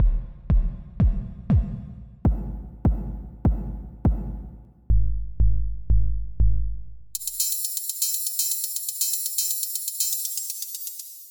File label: reverb